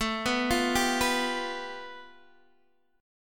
A 7th Suspended 2nd Sharp 5th A Guitar Open D, chord